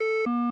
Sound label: defib failed; Items